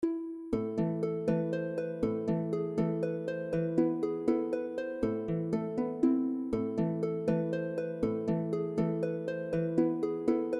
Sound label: mazurka breton